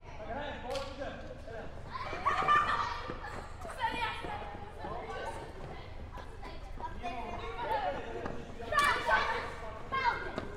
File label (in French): enfants prague